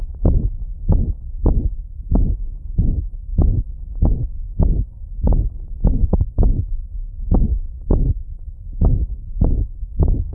VES not to see only to hear, Heartsound, Arrythmia; Heartsound Library